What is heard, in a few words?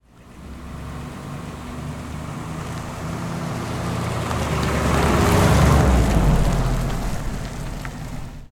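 cadillac 1960 30mph driveby left to right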